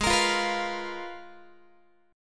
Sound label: Ab Minor 6th Add 9th Ab, Guitar; strummed; x,16,13,15,15,16; down; 4A,4E,5A,5D,5Gsharp,6Csharp